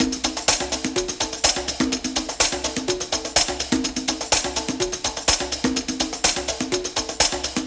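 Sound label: music loop percussion; disco danny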